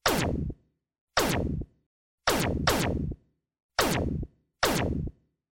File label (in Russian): vistreli iz blastera